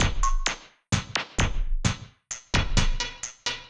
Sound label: Octave Down Loop 130BPM, DrumLoop Ghost Layers